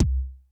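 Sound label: analog kick; 90 sSampleCDs; Crypton - DrumBase5000; ANALOG KITS1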